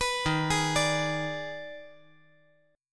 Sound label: Eb Major 7th Suspended 4th Sharp 5th Eb; arpeggiated; EbM7sus4#5